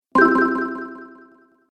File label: App Delay, ping; multimedia